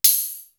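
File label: synth hat, cymbal; East West; Global Sounds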